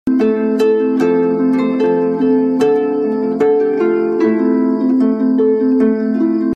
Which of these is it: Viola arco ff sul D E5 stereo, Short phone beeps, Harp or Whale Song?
Harp